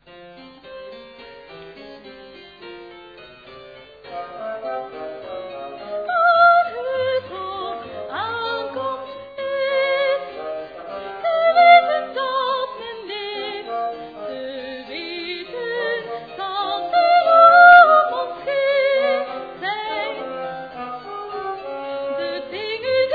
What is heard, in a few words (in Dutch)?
muziek; Vogel